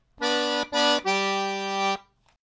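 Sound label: right hand licks, Phrase three; Back Door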